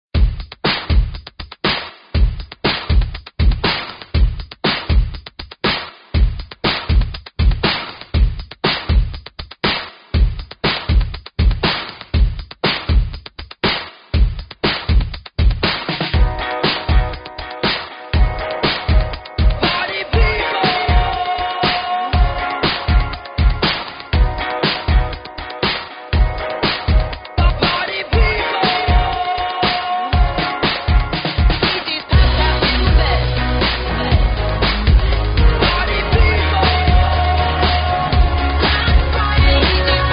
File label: funk; multitrax